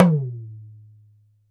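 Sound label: Talking Drum hi down; Drums&Percussion; E-MU Producer Series Vol. 7 – Old World Instruments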